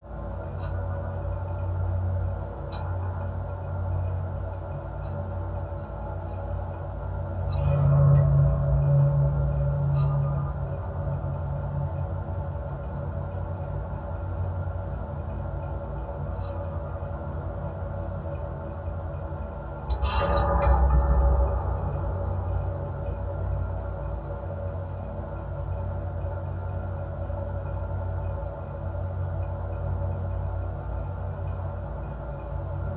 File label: Ambience Machine Pr